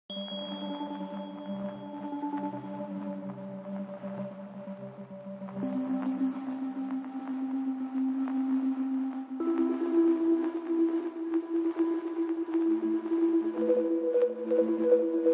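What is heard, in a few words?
background music without words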